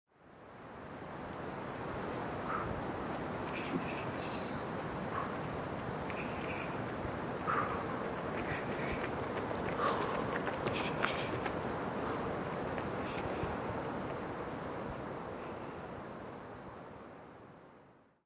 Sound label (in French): ambiance jogger